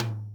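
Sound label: 626 mid tom; Crypton - DrumBase5000; TR-606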